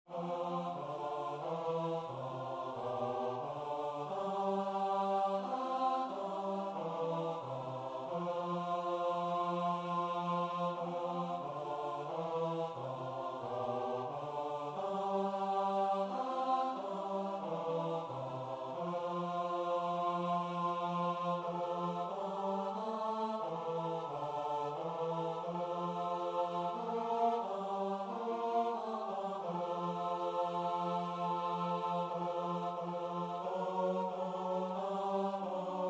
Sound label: Bas; korsats